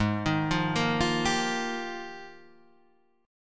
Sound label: F Minor 11th, guitar chord